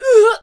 Harvester Death Vocals

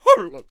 Bard Attack Vocals

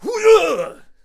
Hwacha Attack Vocals

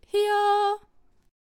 Celestial Aegis Attack Vocals

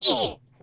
Banshee Attack Vocals

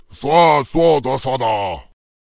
Samurai Giant Attack Vocals

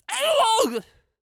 Raptor Rider Death Vocals

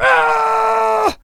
Painter Death Vocals

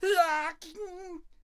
Ice Archer Attack Vocals

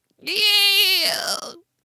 Ice Mage Death Vocals

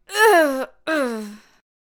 Celestial Aegis Death Vocals

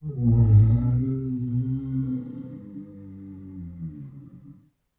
Ice Giant Death Vocals

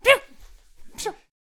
Poacher Attack Vocals

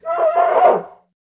Mammoth Attack Vocals

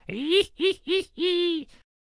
Scarecrow Attack Vocals

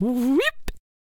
Chariot Attack Vocals

